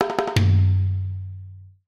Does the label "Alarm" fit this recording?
No